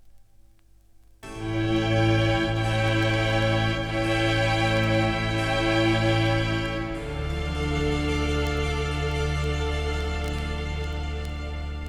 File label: Concerto for 2 Trumpets; Vivaldi; Paillard